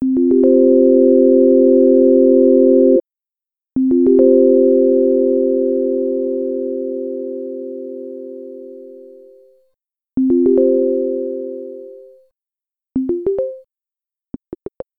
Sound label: c700 env dec, envelope; SR1 being raised, decreasing the amount of time it takes to fade to zero; ADSR Envelope; MIDI CC#82 (SR1)